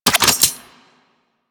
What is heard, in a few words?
Scoop Equip; Missions; Tenno Ball